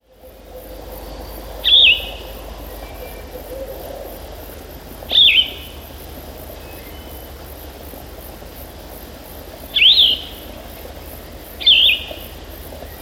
soothing winter rain sounds

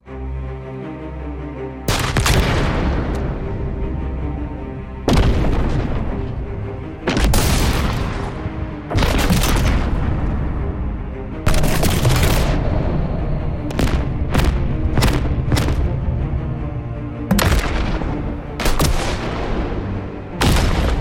audio explosion sfx pack

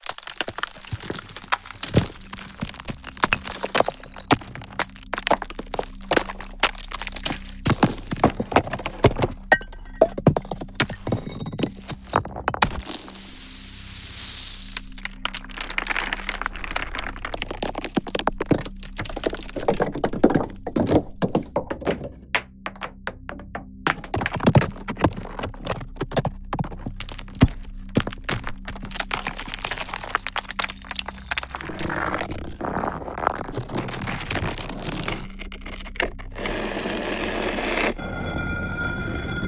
stones and debris sound fx; just sound effects

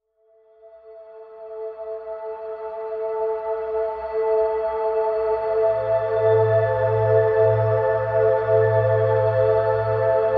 soundscapes sci fi sound fx; cinetools

